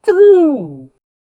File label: success